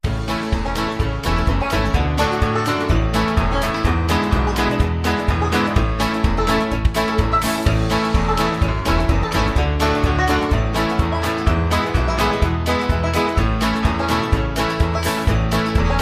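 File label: Instrumental with background vocals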